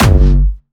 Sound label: Jumpstyle Kick G1, vengeance essential bassdrums; Jumpstyle Multis; vengeance essential club sounds-3; VEC3 Bassdrums